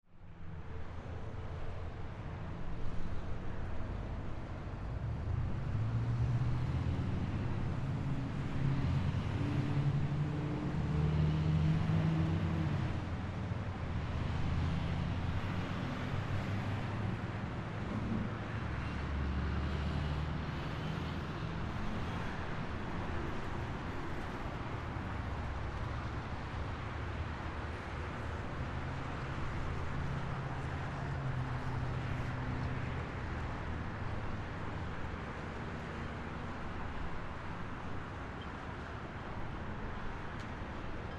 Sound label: Traffic Distant; From Parking Lot Flag Pole Clank; Ambience